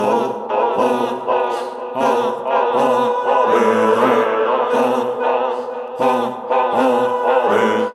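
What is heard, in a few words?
Monastery Phrase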